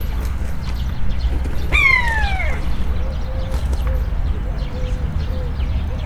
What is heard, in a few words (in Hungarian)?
egerészölyv; Hortobágyi Nemzeti Park 2013